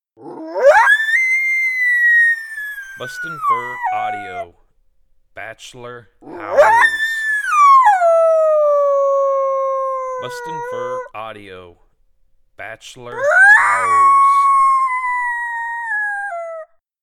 Bachelor Howls

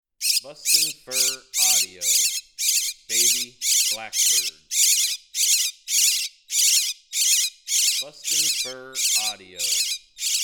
Baby Blackbird

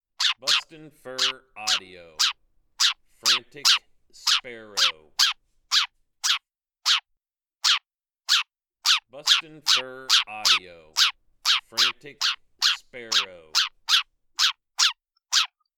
Frantic Sparrow

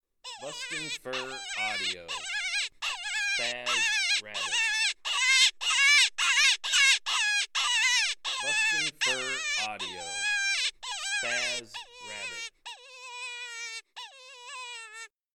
Spazz Rabbit

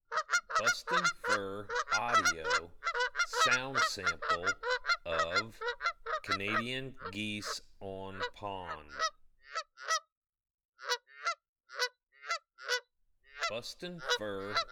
Canadian Geese On Pond

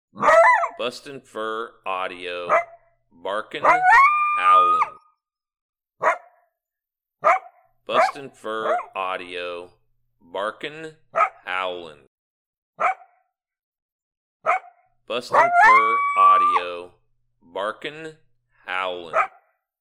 Bark'n Howl'n

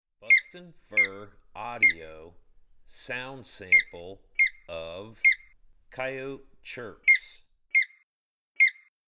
Coyote Chirps

